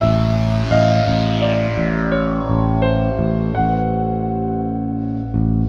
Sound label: keys, Sad